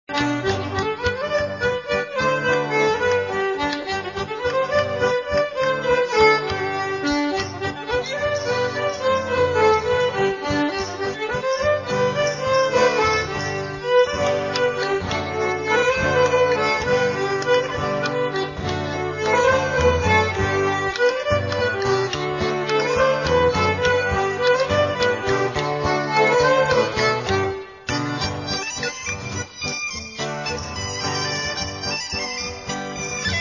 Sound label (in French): bourree